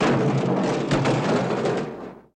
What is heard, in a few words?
Garbage Cans Tumble